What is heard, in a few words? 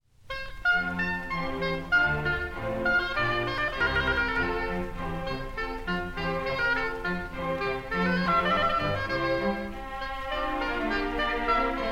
concerto oboe; corelli; op5 n10